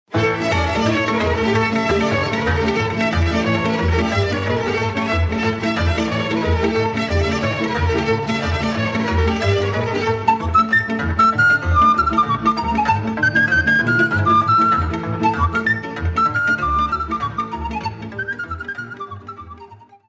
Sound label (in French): Sirba, Roumanie